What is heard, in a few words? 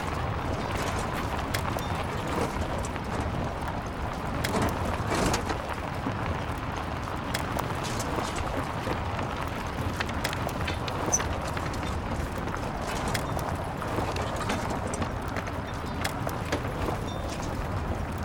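ambience dirt loop; Blind Drive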